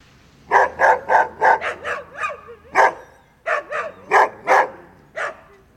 Dog Barks Howls, dog

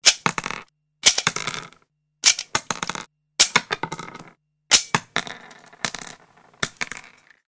Eject Ammo From Clip, handgun

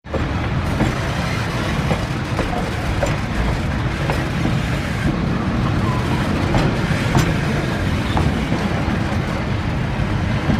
Steam Train Boxcar, steam train

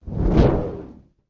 Fire Ball Catapulted

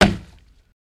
Axe Chop Wood Wood Ri

